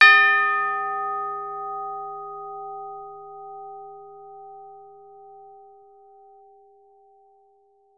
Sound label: TBL LG D#5, orchestra; Advanced Orchestra